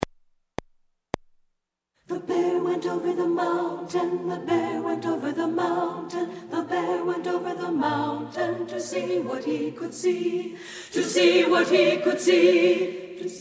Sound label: The Bear Went Over the Mountain, alto